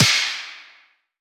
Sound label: tap; Heaven Studio Plus; tap Troupe